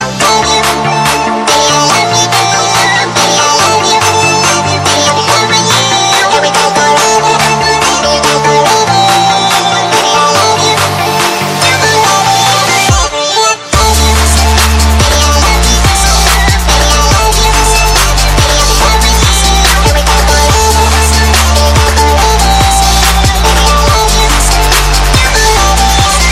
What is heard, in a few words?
Love you ringtone